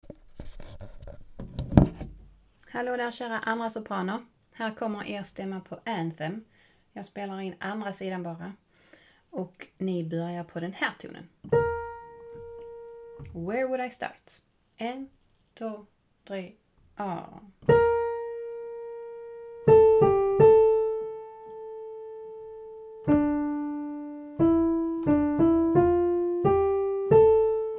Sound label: Anthem sop; Anthem - Sop 2; Information till kören